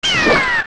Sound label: Guay Die